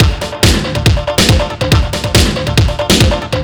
Overdrive Pounding, Drums Beats

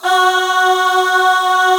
F#3, Basic Pop Ahhs

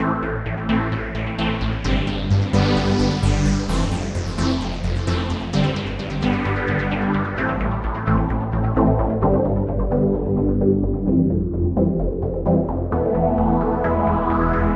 Evolution Seq; Dr. Fink's Funk Factory

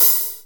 FX Cymbals, Percussion; ohh; Dance Synths

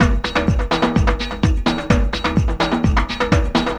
TRIBAL, Techno Trance; E-MU Formula 4000 Series Vol. 2

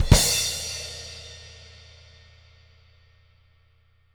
DBLCW KIK -R, Living Drums, SD CYMBALS; Sampleheads; Peter Erskine

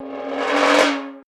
movie, impacts & hits; Techno Trance Essentials

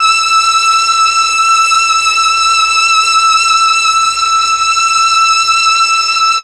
MEL STR, Legendary M400; ILIO; Propeller Island

